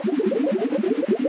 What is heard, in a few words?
bubble loop; jellyfish; battle